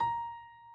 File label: key; keySounds